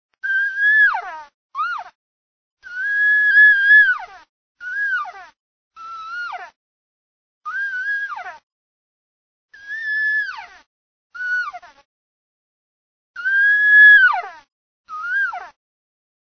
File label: cow, elk; elk contest; elk 2001